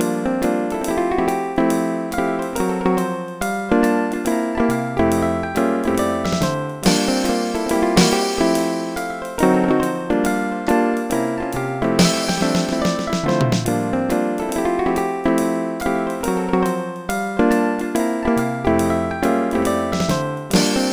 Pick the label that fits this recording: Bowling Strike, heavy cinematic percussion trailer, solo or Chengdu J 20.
solo